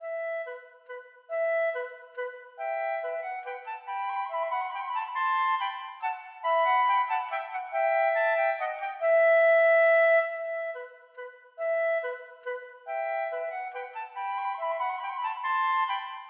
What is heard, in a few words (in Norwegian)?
Menuet for fløyteduett; Aamodt